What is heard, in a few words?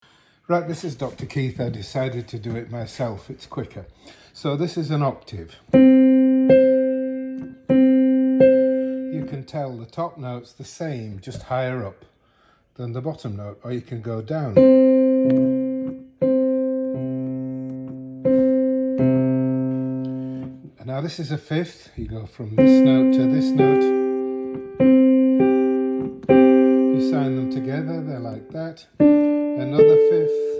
musical intervals